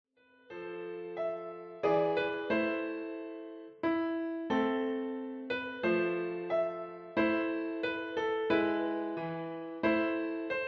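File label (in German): Maria durch ein Dornwald ging, Klavier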